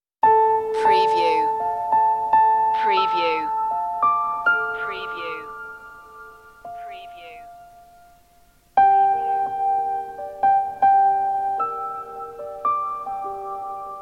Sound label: waltz